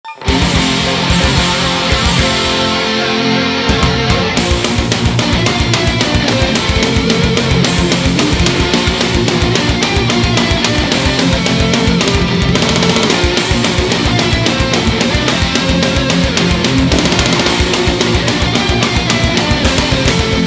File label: metal; sonic the hedgehog 2